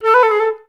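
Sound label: flutes A3; Best Service; Peter Siedlaczek's Advanced Orchestra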